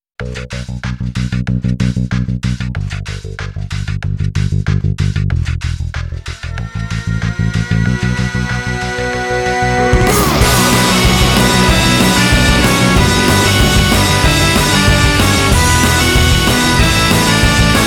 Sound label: Bagpipe Rollers